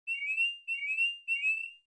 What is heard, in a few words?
Chitter, ringtones